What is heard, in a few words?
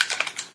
m16 mag out; weapons; svencoop